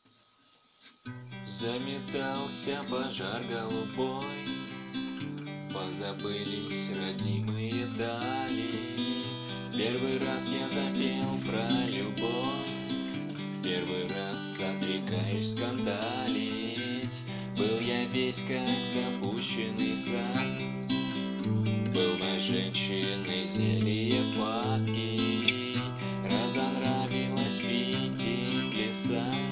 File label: guitar cover; стихи Сергей Есенин